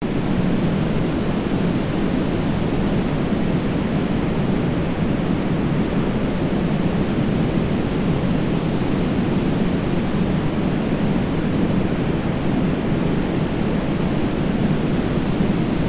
Binaural Indigo Noise

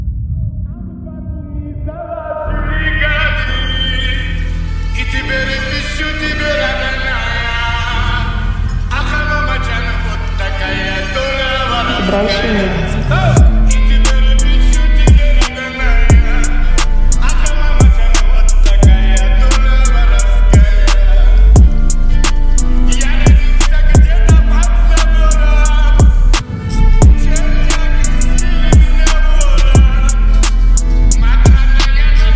beat

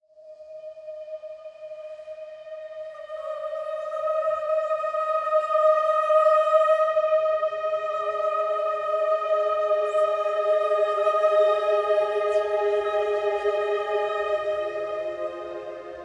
Jazz Music Therapy for Cats

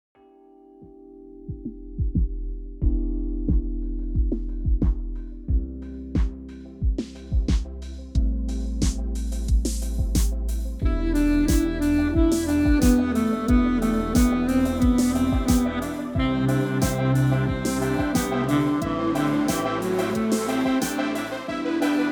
Truculent Brass

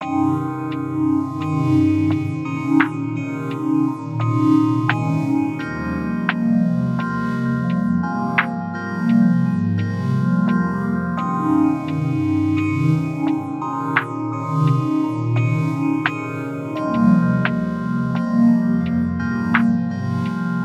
Isochronic tones; Isochronic Tones Study

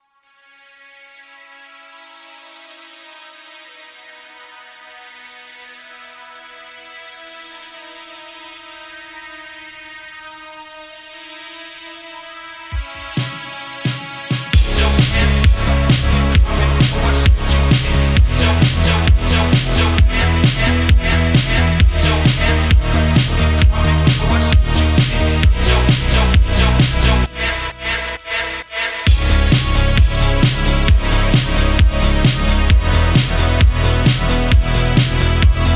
Phonk Slowed

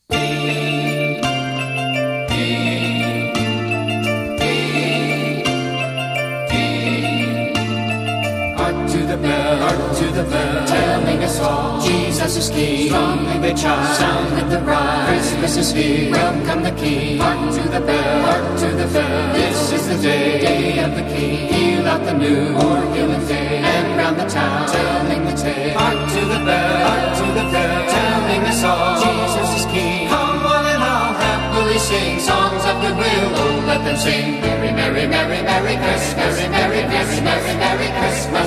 Christmas Chorus, Carol of the Bells

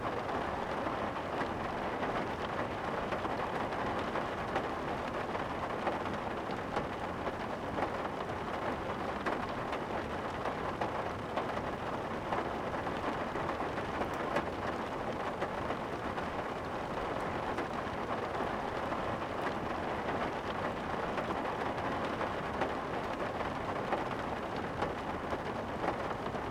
Whispering Rainy Lullaby

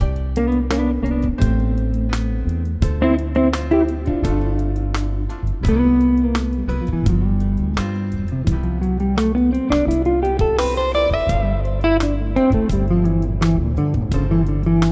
Strings

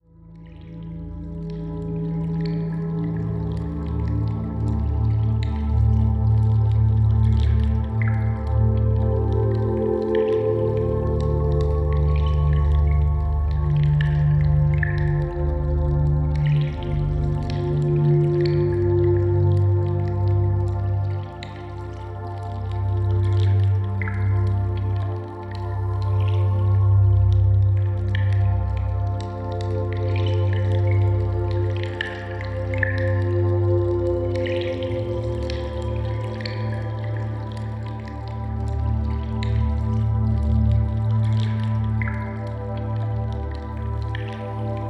Whales as Sirens, Water Sounds

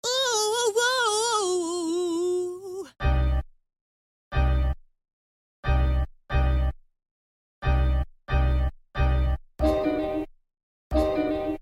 Urban Beat

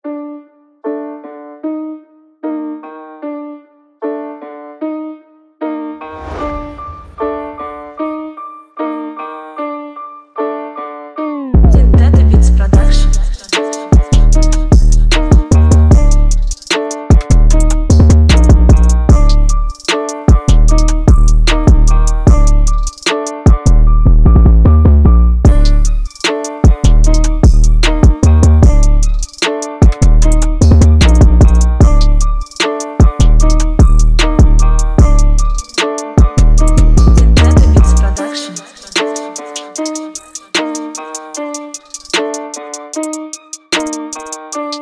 Instrumental

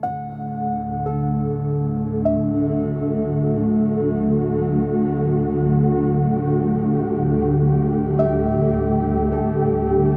Crystal Sound Bath, 4.2 Hz Theta Waves

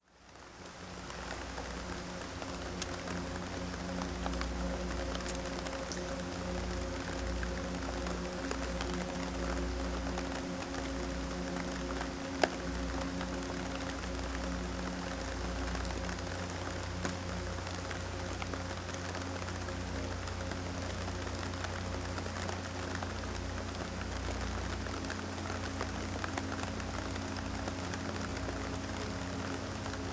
Peaceful Rainfall

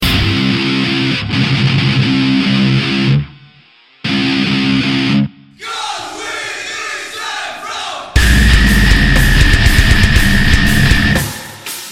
Deathcore, Breakdown

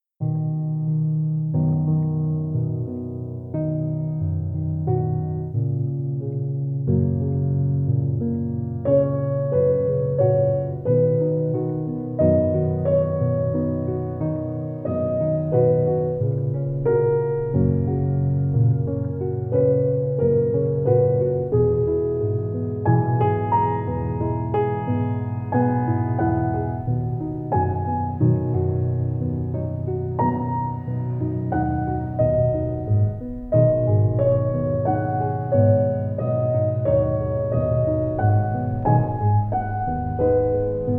Relaxing Piano Music

